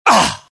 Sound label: death, pain; mohaa; dialogue